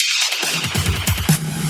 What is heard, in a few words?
Loops Fills; Vengeance Samples